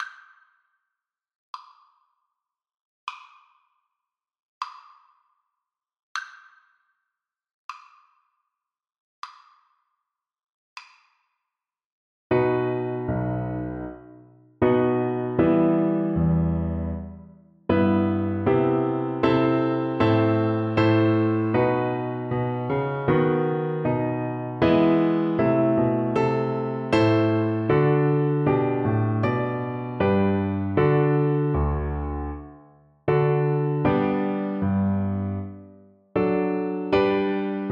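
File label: sonata 3rd movement, flute; telemann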